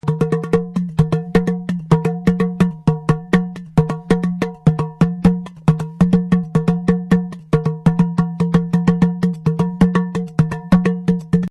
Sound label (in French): arrosoir; Divers tambours